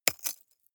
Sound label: drop stones small